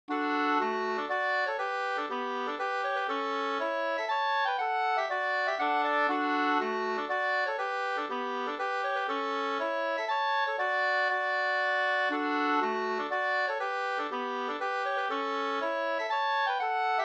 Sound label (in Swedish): polska Bodapolska